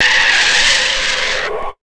Big Beat Drumloops; USB Soundscan vol.25; AKAI

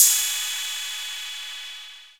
CRASH; Kid Nepro; Elite

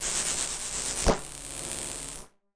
Casio, Glitched, malfunctioning synth

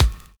HORSE BEA; Univers Sons

